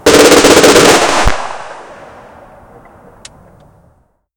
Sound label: historical